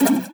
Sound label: Buttons Mechanical; Multimedia Internet CD-Rom Flash